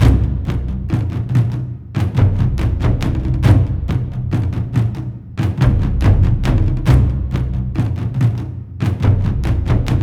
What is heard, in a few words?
Drums; For Honor